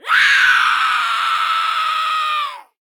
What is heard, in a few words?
Female Scream